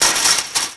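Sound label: chain link; WhiteOut; XBOX; iceberg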